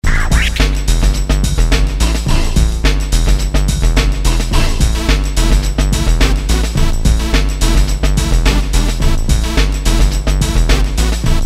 jingle Pop

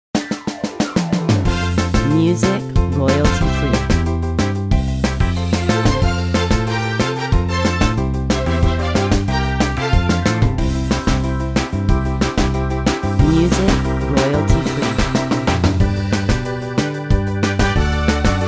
Upbeat Twist

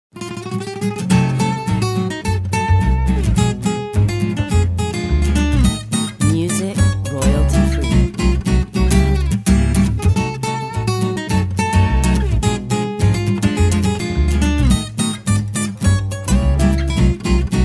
Sweet Swing

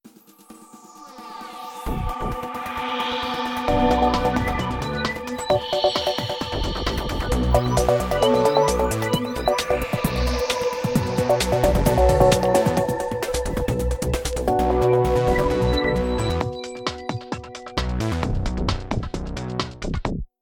Ambient

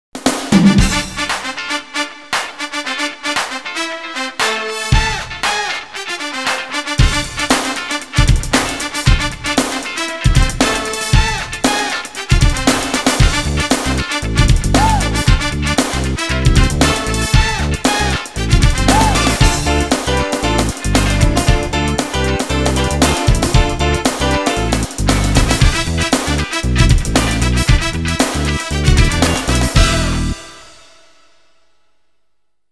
Funky

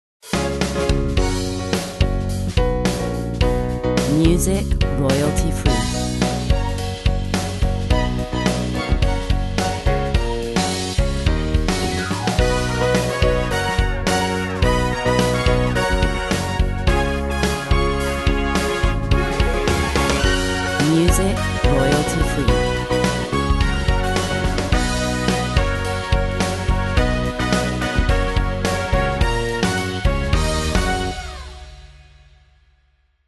Upbeat pop

